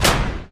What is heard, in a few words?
firework